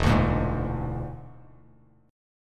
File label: E 7th Flat 5th, down, Bass Standard; E7b5 strummed; JGuitar